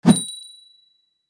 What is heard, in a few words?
Cheerful swipe transition; shorts sound effects